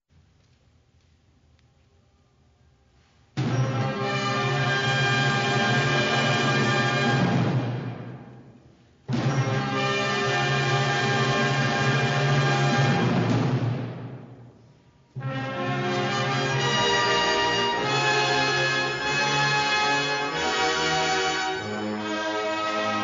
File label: Fanfare excerpt